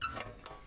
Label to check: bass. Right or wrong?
Wrong